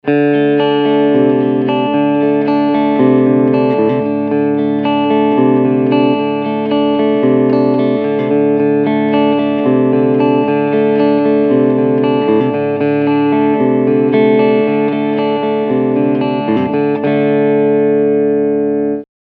Clean Picking beide PUs

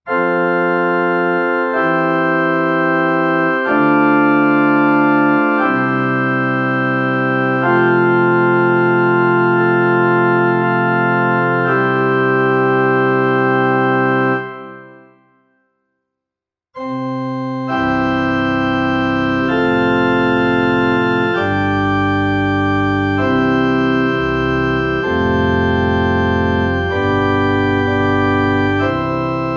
Casio PX-S3000 Pfeifenorgel

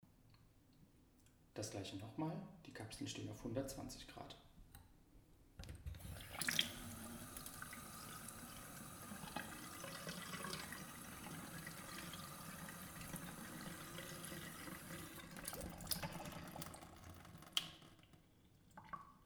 iq6 wasser 120

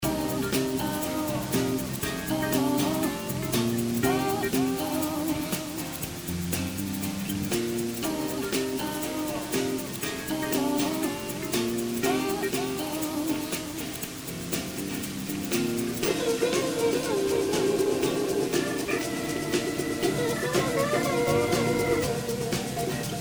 song schellacksound; steinberg spectral layers pro 8

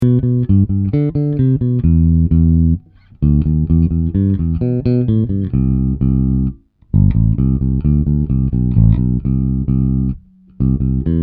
klangbeispiel 2 finger

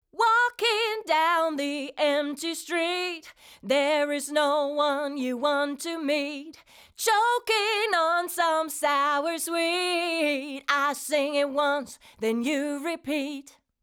UK Sound 276 Vocal All in Attack 10 Uhr Release 2 max. Reduktion ca. 20 dB

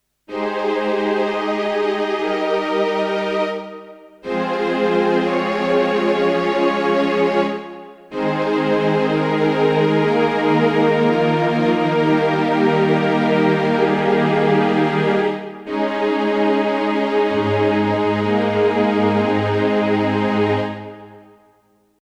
HP504 Streicher

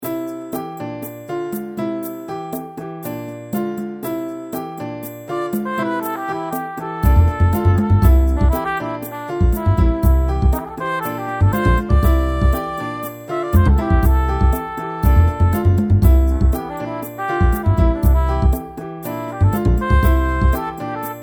Trompete Solo

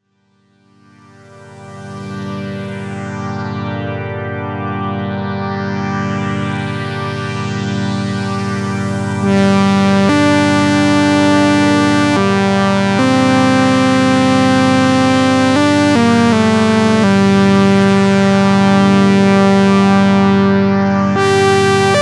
Orgel Pad; Nord Stage

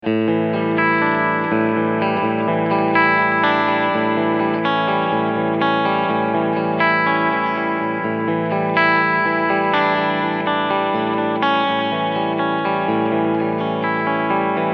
Clean EQ 12 Uhr no Boost

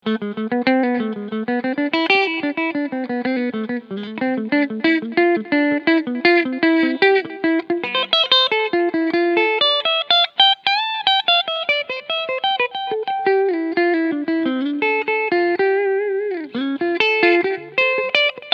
Telecaster Cleansound beide PUs Picking; Fender Vintera 50s

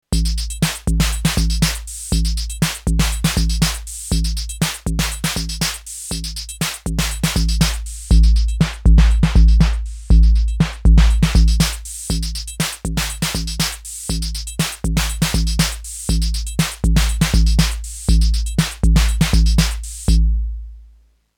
Filter Mittelstellung; Blck Noir; 5mm

